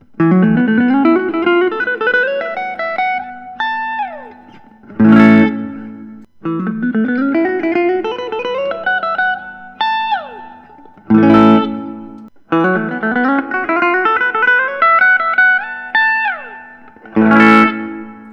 gibson memphis 59

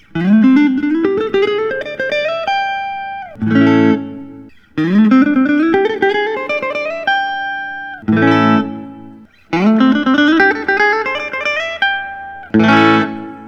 fender custom shop; willcutt true 6

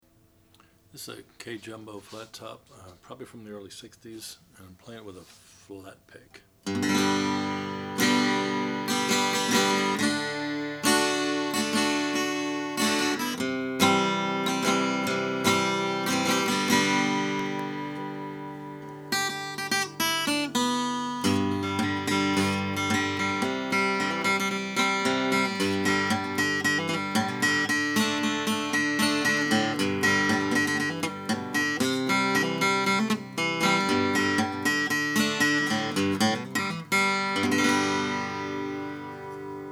kay auditorium 6116 walnut sunburst

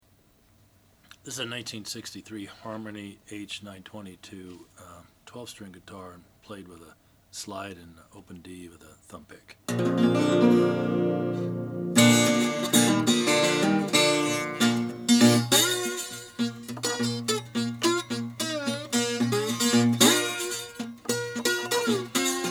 harmony stella h922 yellow burst

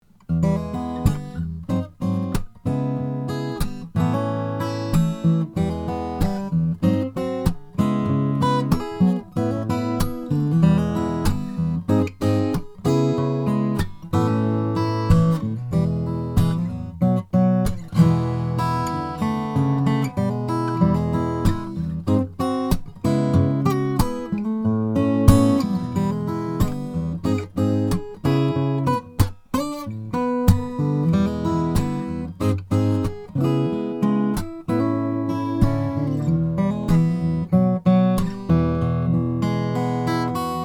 taylor 416ce ovankol

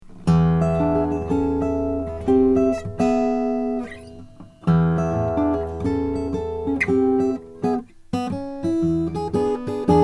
froggy bottom c deluxe 5a sinker mahogany

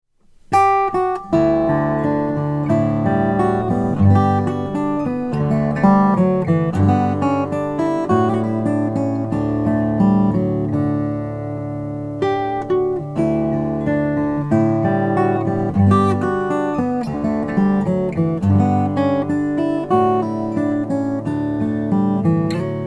strahm eros african blackwood

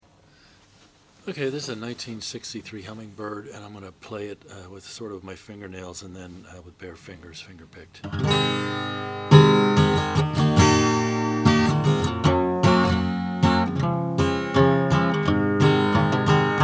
gibson hummingbird natural

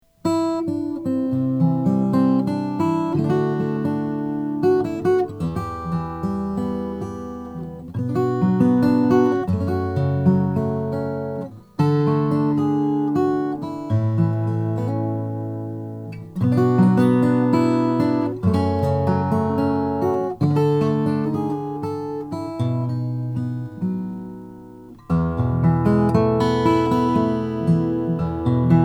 gerber sj nicaraguan rosewood

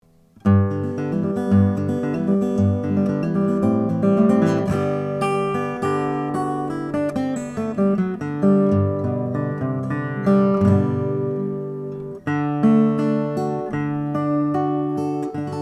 martin 00-18 mahogany

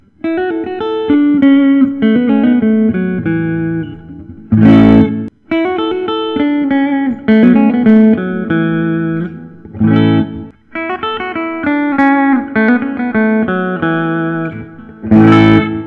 collings city limits

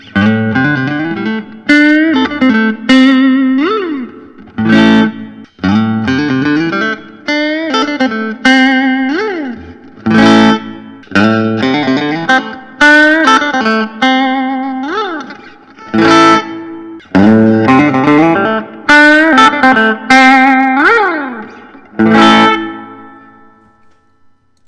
suhr classic t custom hs black gloss

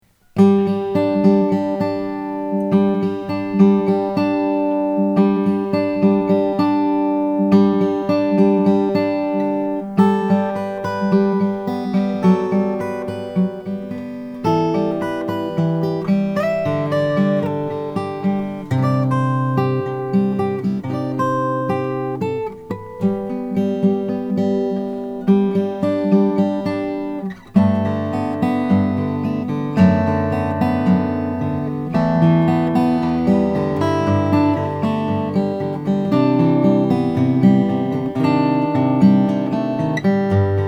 mgc cutaway indian rosewood; 1999

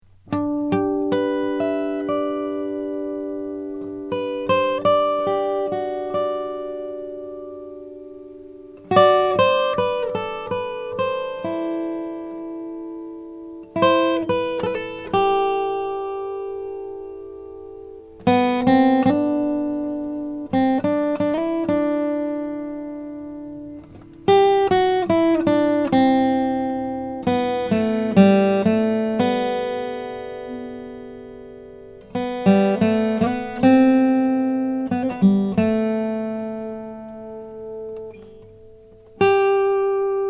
lowden f50 ancient bog

